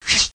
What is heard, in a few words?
Brush